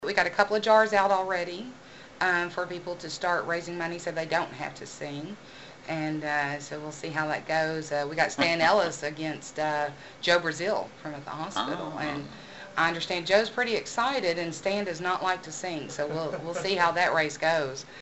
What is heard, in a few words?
KARAOKE